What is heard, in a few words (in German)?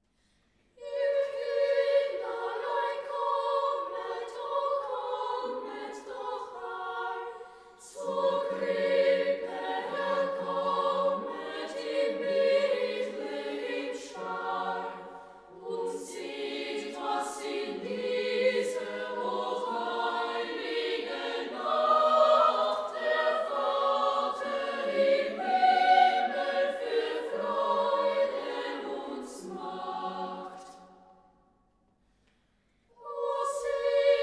Ihr Kinderlein kommet (Arr. for Choir); Sacred Choral Music